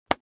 widow look at end, knife; arccw go

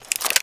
mag7 draw; arccw go

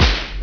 shoot; prdroid

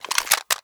mp5 draw; arccw go

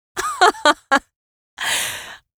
laugh

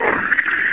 ag idle, agrunt

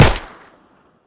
hkp2000; arccw go

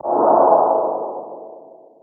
357 shot distant; hl1 weapon

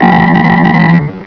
toad idle; hl1 npc; chumtoad

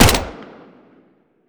mac10; arccw go

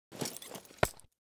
knife falchion inspect; arccw go

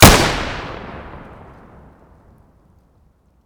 ssg08 fire; vj weapons

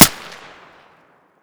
m16a1 single suppressed; vj weapons

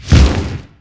wings; hl1 npc; stukabat

